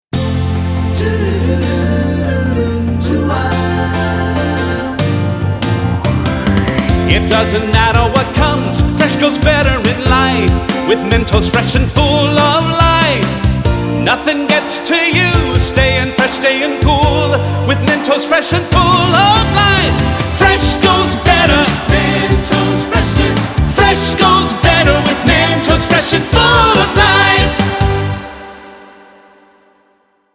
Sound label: VOC FEMALE UP